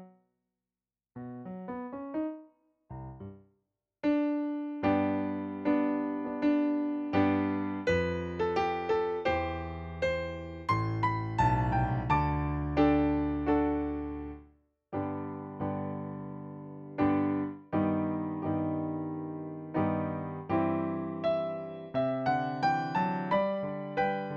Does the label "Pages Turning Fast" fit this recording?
No